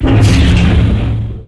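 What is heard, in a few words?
silo door start open; hl2 ep2; ambient, levels, outland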